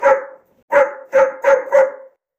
dog barking sound, artificial

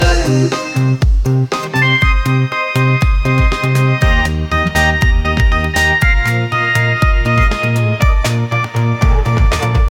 eurodisco80, disco

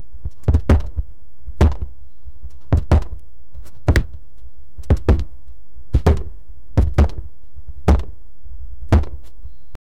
boxing

